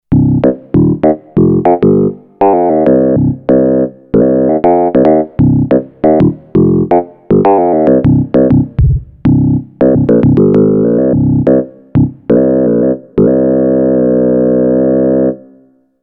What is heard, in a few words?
Fm Bass